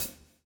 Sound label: SWING